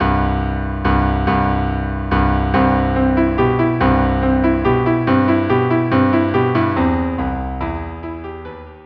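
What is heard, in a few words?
viola cello; Grieg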